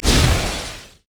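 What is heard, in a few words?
basic attack on hit; LoL; karthus; lich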